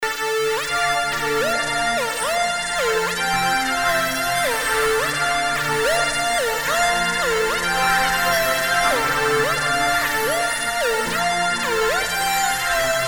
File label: Rington